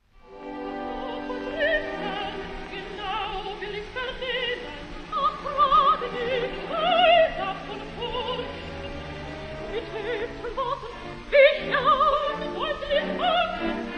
Wagnerian soprano

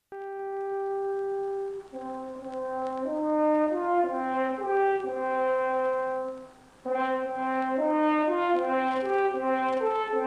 serenade for tenor horn strings; britten

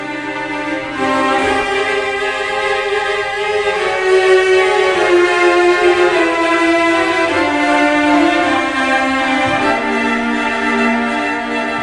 cellos

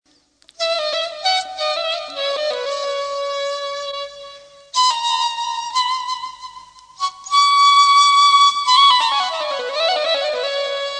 Owj Ghis Bam narm

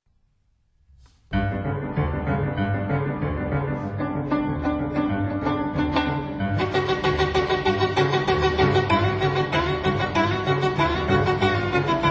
sabre dance

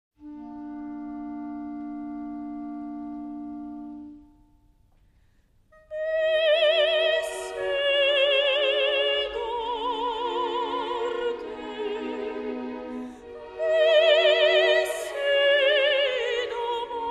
soprano

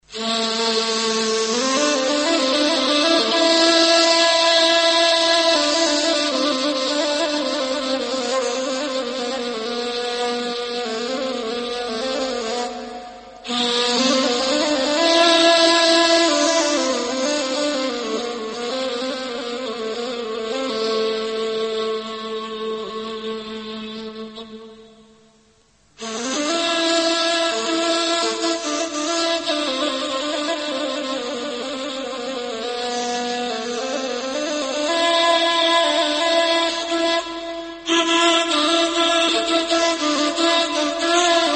Ney Boland Chupani Mahali Bakhtiyari

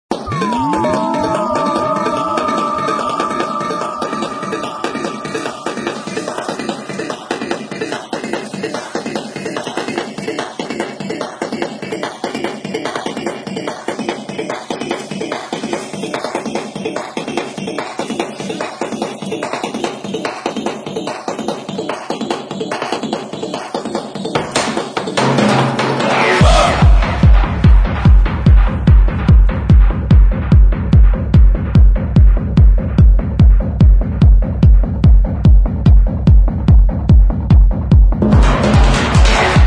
trance

